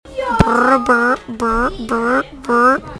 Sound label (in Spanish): despertador remix